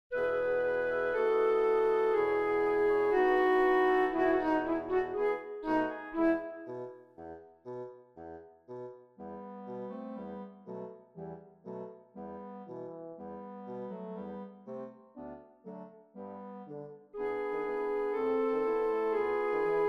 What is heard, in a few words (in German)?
Floete